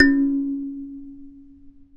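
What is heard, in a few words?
C#3 kalimba left; WorldSounds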